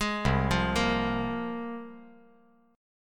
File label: C 7th Suspended 4th Sharp 5th, C, down